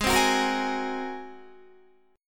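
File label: A flat 9th A flat Guitar, chord; strummed; 4E,4A,5D,5G,5C,6F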